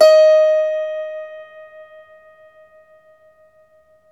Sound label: IRISH HARP